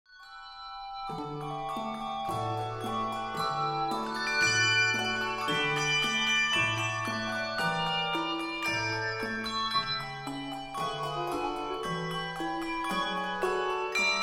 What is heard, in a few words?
dance of the reed flutes; xmas